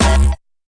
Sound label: fx drum